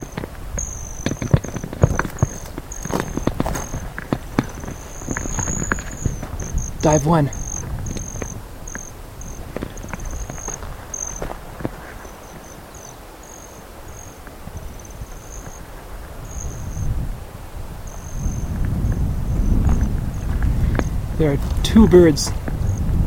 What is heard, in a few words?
Splatycercus